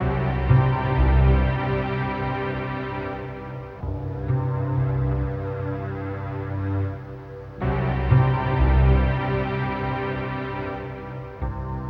keys, Sad

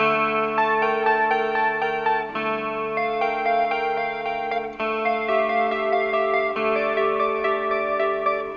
guitar, Loops Sad

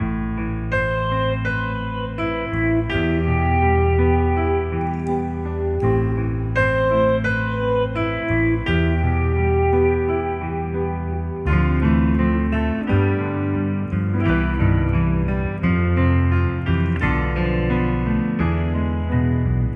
keys longing; Loops; Sad

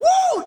woo

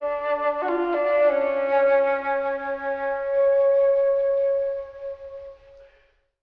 flute tweedle, Loops, Sad

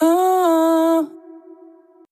uh uhhh

